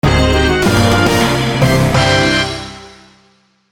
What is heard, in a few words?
fanfare